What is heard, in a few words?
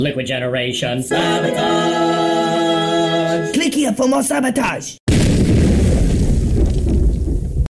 jingle; Liquid Generation Sabotage